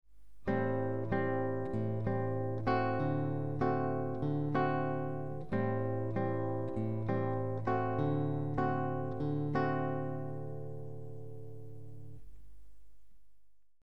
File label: bossa